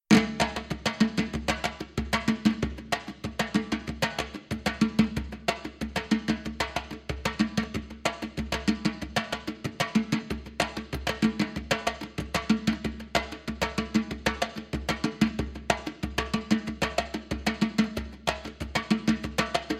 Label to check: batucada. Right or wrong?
Right